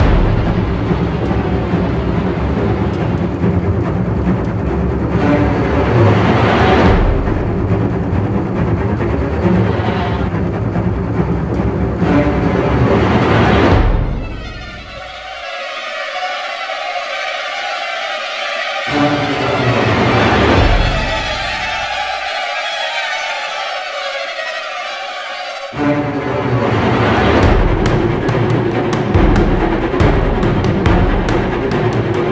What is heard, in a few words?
Suspense; Prototype